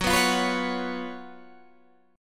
Gb Major 7th Suspended 4th, down, Guitar, Half Step Down